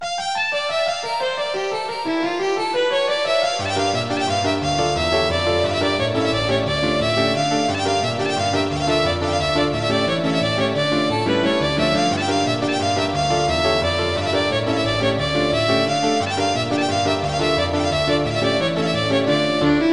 violine u klavier klavier

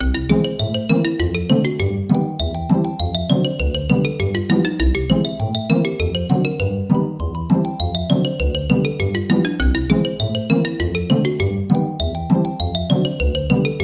xylo pizz

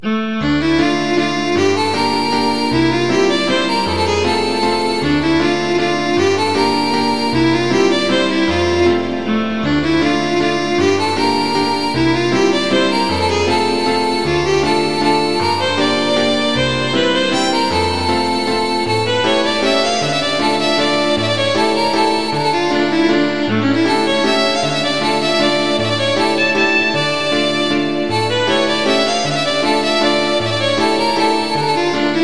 walzer a moll violine u klavier; chopin

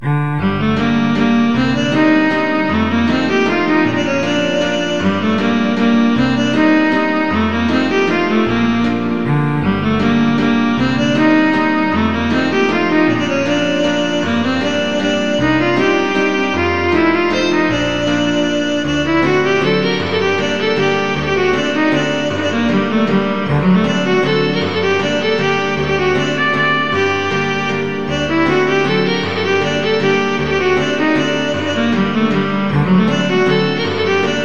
walzer a moll viola u klavier; chopin

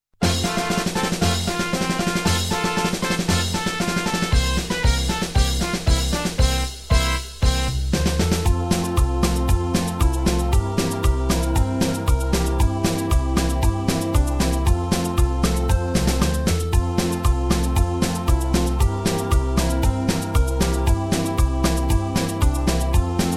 zirkuslieder akrobatenlied playback

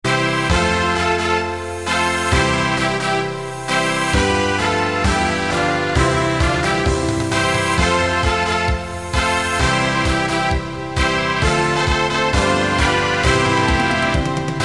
zirkuslieder herein spaziert playback

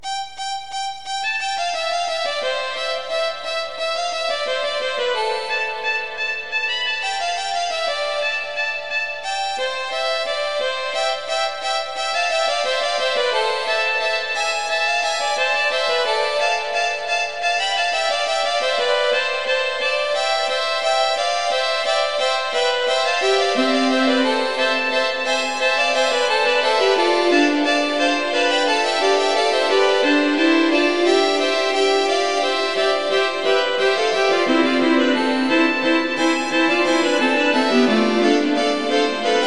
kanon fuer streichsextett partitur

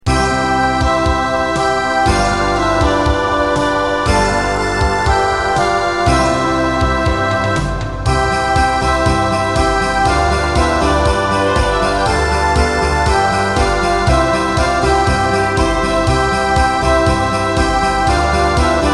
zirkuslieder weihnacht im zirkuszelt playback

